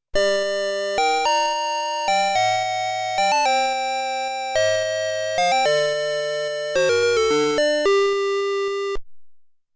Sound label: Vengerskii tanetc